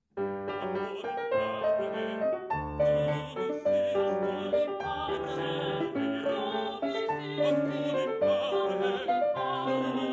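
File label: soprano; quam olim; schola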